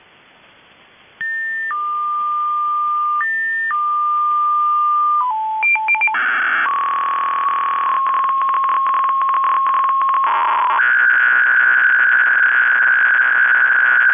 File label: PSK n x 62.5 Bd QPSK hybrid (PARALLEL); (Russian Intelligence; Russian Intelligence / experimental mode? / active 2013